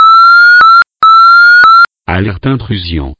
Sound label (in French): alarme